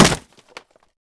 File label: Wood Shield Hit; Civ4 warlords